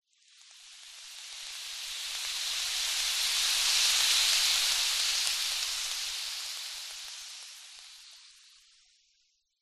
horror acid burn skin bubble

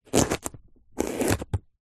carrot slice knife; zapsplat

